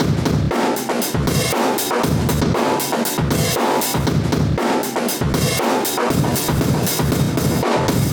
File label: 118 live breaks